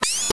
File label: sniper Rifle zoom in; weapons